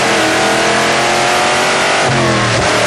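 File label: third, car engine; sgmcars; rat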